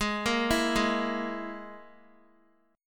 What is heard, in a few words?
G sharp Suspended 2nd Sharp 5th, Guitar; G#sus2#5; arpeggiated; JGuitar